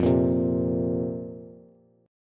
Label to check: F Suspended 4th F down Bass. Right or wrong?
Right